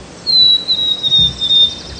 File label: Song, Poecile atricapillus; Passeriformes; Paridae